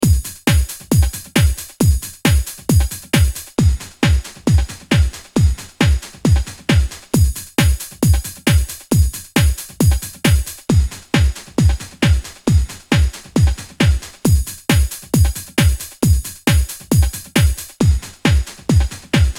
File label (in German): Drum Loop, zuerst Original dann 8Bit 32kHz; Arturia CMI V